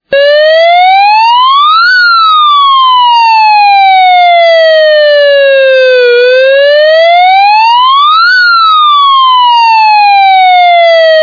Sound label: alarm fire engine